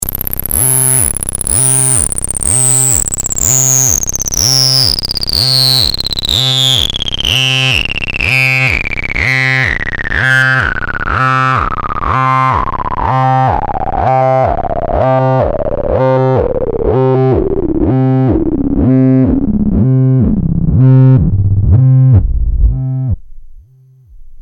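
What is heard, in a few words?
long slide filter sweep; c64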